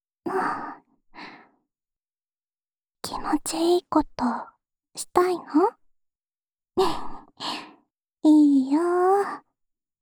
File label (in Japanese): 安眠用お耳ふーふーループ（右耳）, ASMR